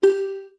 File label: Pause Menu Select; Make a selection on the Pause menu (Recorded)